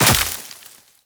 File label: Land Veg, Footsteps; Warframe; Tenno